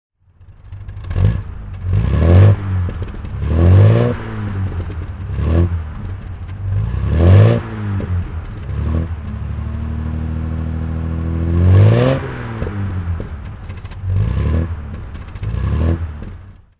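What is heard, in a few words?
jaguar MkV 35L saloon throttle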